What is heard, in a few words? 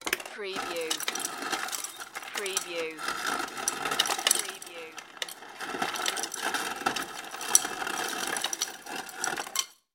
Ice Maker Dispense; sound-effects-bible